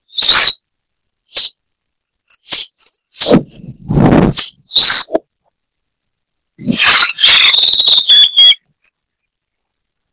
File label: Coryphistera alaudina - Crestudo